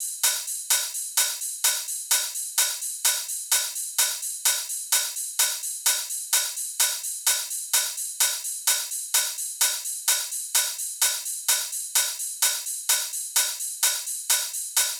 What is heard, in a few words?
Top Loop - 128 BPM, Drum Loops; House; Cymatics